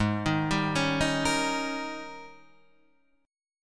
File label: G sharp Major 7th Suspended 2nd Suspended 4th; G#M7sus2sus4 Chord {4 6 5 6 4 6} arpeggiated